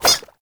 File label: Shrink Baby Jump; Warframe; Nokko